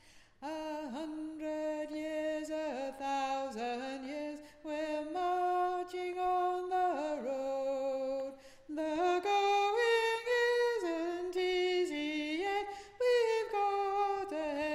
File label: Alto; Chartist Anthem